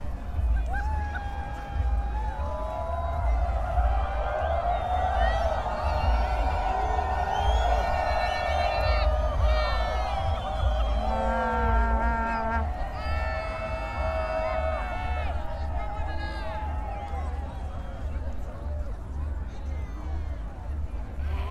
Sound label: cheering